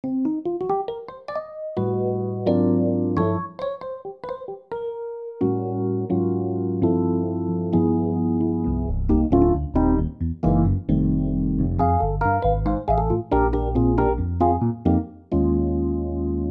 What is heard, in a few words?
Rhodes; xv-5080; Taxi Rhodes; SRX